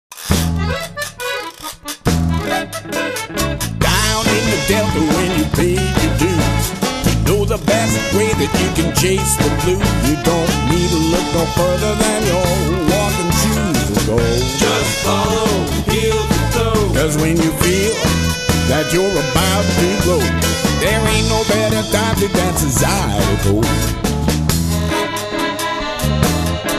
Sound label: Zydeco Time